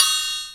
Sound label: TICTALK DRUM